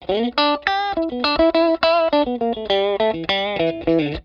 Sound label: STRAT POS 2 LICK, S pickup set